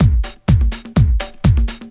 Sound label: drm 125, drumloops; PS-28 Vocal House Samples; realworx